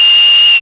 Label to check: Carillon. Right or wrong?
Wrong